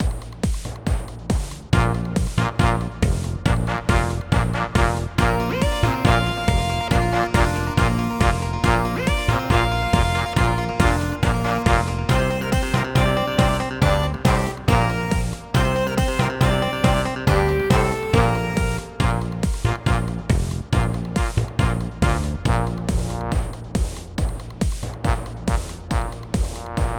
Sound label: menu; Nick Summer Sports Stars